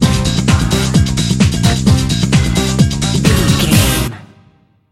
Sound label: gonna get busy bumper, Dance, EDM, Club, Exercise, Aerobic, Aerobics, Spinning, Workout, Disco, Eurodance, Confident, Energetic, Cheerful